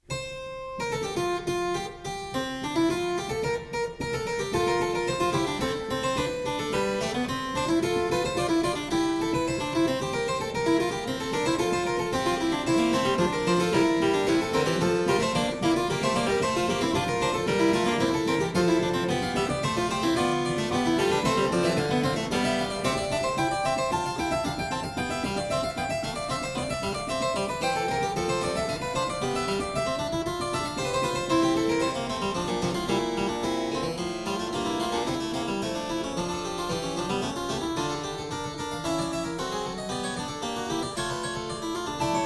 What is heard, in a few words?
Suite in F Major - Allegro - Fuga, Harpsichord; Suite No. 2; HWV 427; Handel; Suites for Harpsichord